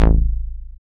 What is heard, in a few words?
Dance Bass; Sound & Vision - Gigapack II CD 1